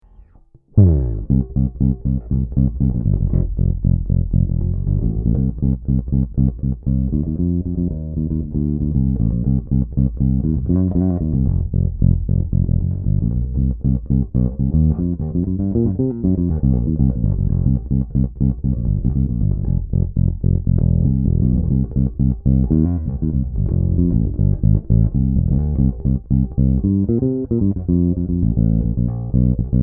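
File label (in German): JB-75 SB Vintage Series Fingerstyle Neck PU Tone zu, bass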